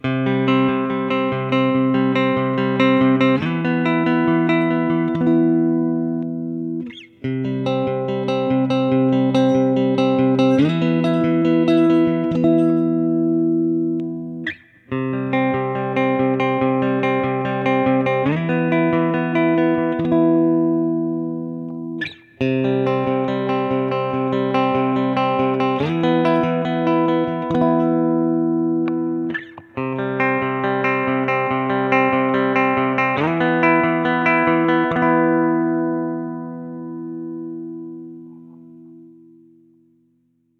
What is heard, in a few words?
Picking Clean, pickups